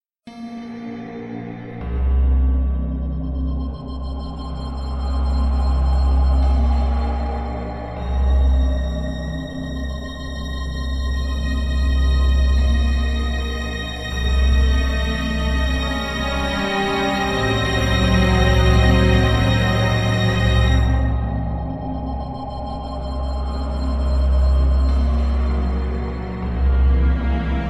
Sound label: haunted forest